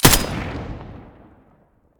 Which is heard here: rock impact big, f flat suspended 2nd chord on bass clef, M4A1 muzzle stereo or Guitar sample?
M4A1 muzzle stereo